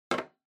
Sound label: Stopwatch Digital Put